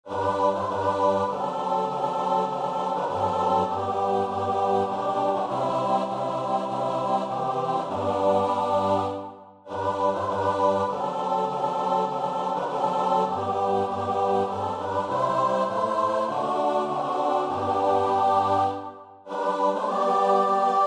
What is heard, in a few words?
CHOIR; i am thine